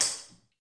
tamb, metals; Spectrasonics; Liquid Grooves 1 & 2

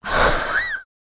LICKS, Jazz; Spectrasonics Vocal Planet; Jazz FX

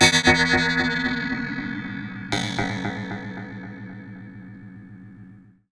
Ring Mod & Delay, contact mic Cymbal; musicradar; contact mic madness

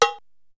Perc; ZERO G - Datafile DISC 1

